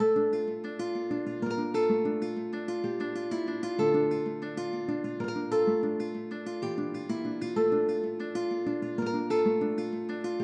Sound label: 127 BPM D Min Guitar; Cymatics; Cult